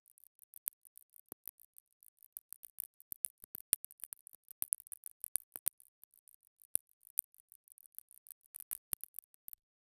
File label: the sound of a crystal, absorb